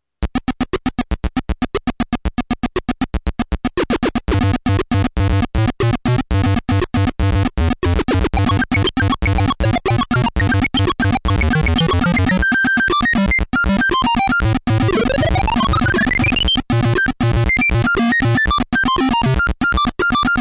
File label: speaker; maniac mansion